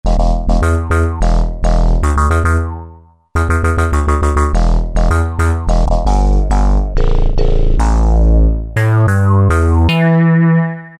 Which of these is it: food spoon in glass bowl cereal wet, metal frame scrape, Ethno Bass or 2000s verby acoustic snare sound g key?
Ethno Bass